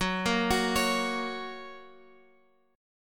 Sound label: F sharp Major, Guitar, Open G